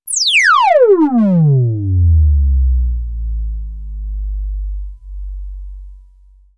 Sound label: sweep, SCIFI; Techno Trance Essentials